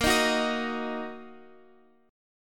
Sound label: Bb Suspended 4th Sharp 5th Bb down Guitar Open F; strummed